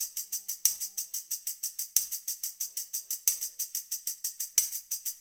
Call And Response Tambourine, 70s Analog Funk; Sonic Foundry (Sony Creative Software); What it Is!

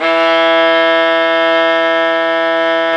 STR XVIOLA, Str Quartet; Viola&Violn

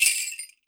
skranz, WORLD PERCUSSION; Best Service ProSamples vol.52 - World Instruments 2; MIXED PERCUSSION

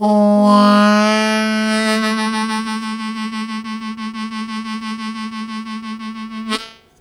Strong Vib w Scoop A0, Harmonica; Zero G

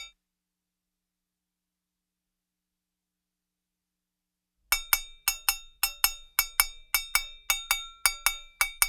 Hand Bell, African; Sonic Foundry (Sony Creative Software); Continental Drift Aftershocks

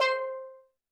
percussion, Roland TD-20

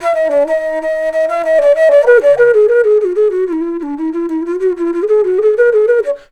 FLUTE D, Indian Traditions

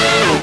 SYN Techno; Best Service Gigapack 1

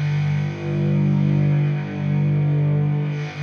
Lap Fuzz FX 140 G, guitar drone samples 140bpm

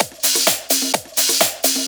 Discohouse Loop 128 BPM

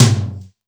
Simmons SDS2000; SDS2000 Factory1

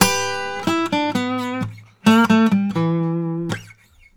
Blues Guitar; ILIO; Fretworks; LICK

